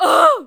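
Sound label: female zombie hit; Project Zomboid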